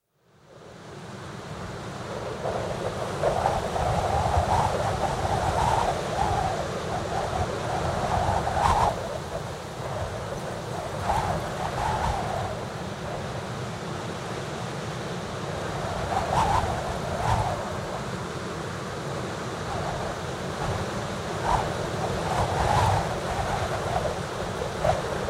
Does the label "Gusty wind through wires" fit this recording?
Yes